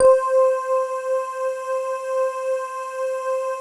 digi pad, Pads

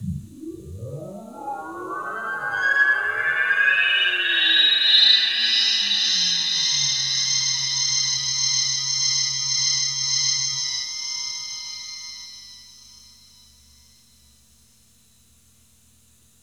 DREAMFILTER, Keys; 3D Sound; 3D Keys VOL-1

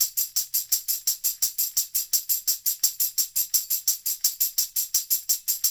LOOP, Percussion

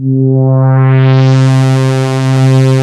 BIGPAD, ROLAND MKS-80; Rubber Chicken Software Sample Bank

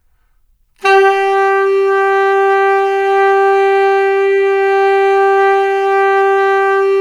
SAX, Tenors; Giga Samples Collection

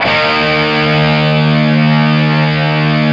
E 4, Soldano rock guitar; Spectrasonics; Hans Zimmer Guitars VOL-1

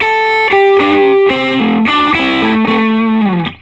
Vintage Blues Guitar, SOLO

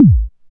BD ANALOG; Sound & Vision - Gigapack II CD 2

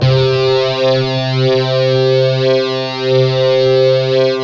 stick, Chapman Stick; Analog Odyssey

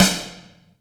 SN, KICK SN; AKAI S-Series CD-ROM Sound Library VOL-2

